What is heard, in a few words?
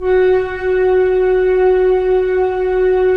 FLT FL, Flute; Roland - LA Composer vol. 4